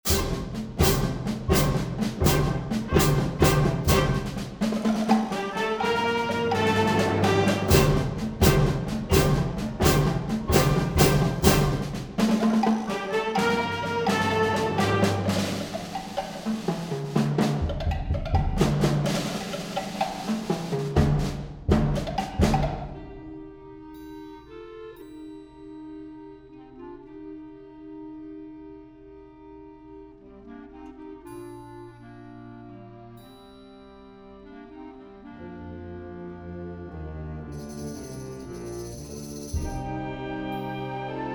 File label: percussion discussion